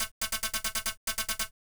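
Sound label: HIHAT ELECTRO 140, Techno Drum; Techno2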